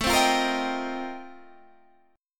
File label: F 11th A, chord